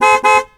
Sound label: Double horn; blastmorph pinball; xbox series xs